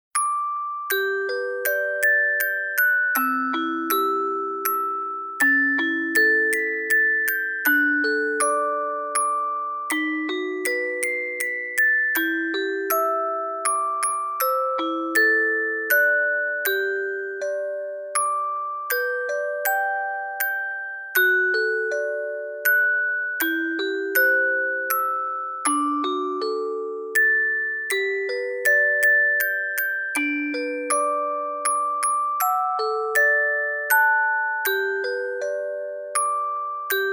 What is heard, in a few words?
christmas we wish; bgm